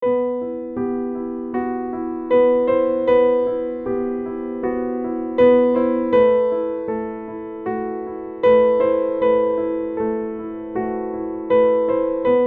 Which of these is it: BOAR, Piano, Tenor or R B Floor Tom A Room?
Piano